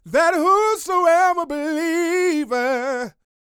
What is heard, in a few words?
Vocal Gospel; Vocal Planet Vol1 Gospel